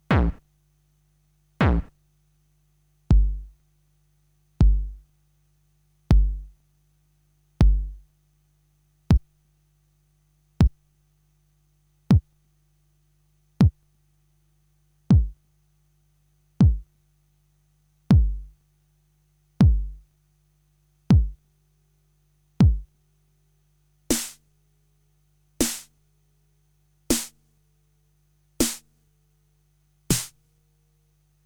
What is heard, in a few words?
Drum Kit 909; Kniteforce; 1000 Samples Volume 1